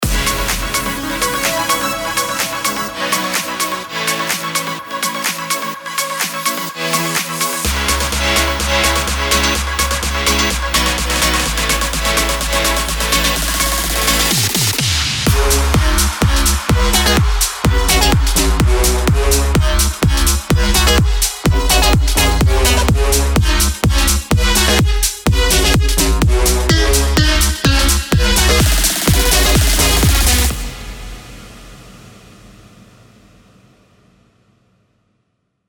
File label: BIG ROOM HOUSE CMIN 126BPM